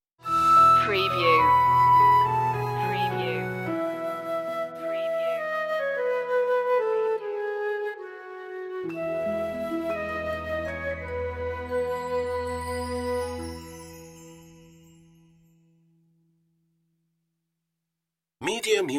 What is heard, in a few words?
orchestral stinger; joy to the world; media music now